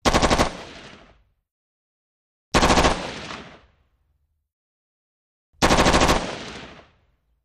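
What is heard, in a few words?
M16 Short Bursts Close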